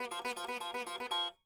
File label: sitar